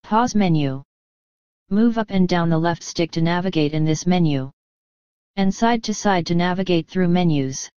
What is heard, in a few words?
menu; DeadFright